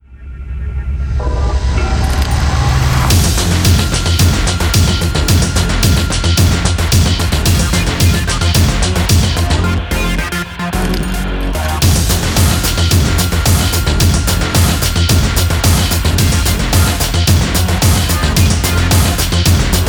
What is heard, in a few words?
scrap metal intro; garrysmod